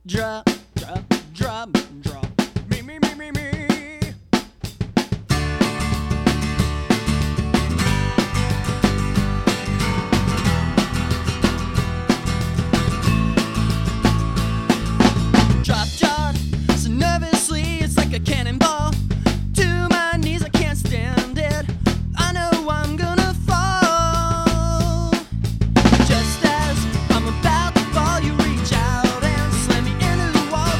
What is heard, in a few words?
Acoustic